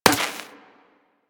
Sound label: Laser Impact Metal; Surface